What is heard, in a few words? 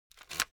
object headphone retract unsteady quick